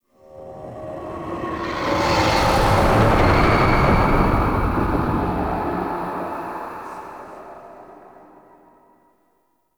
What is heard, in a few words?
Spectral Whoosh; Warframe; Ambience; Entrati